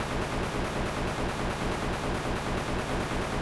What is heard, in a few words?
SPACE SHIPS; SpaceSounds